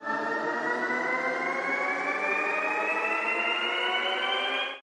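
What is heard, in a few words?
respin sound; longpao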